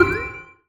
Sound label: UI Confirm